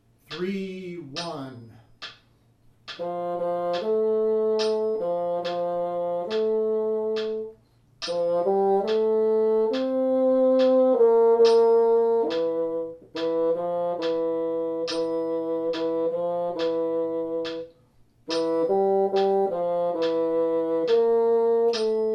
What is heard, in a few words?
Lullaby Bassoon 3 70; Part 2; Rubank Elementary